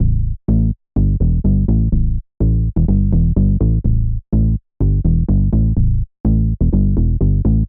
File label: alchemy w distortion